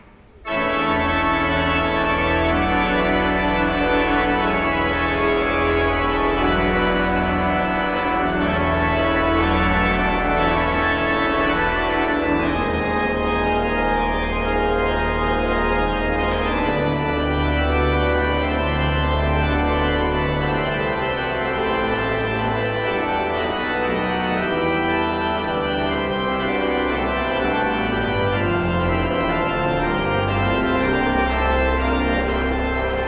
Postlude

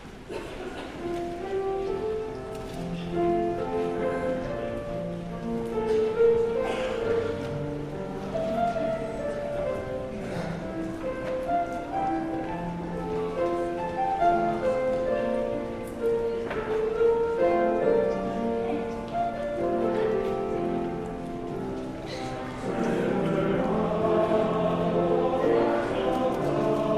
Choral

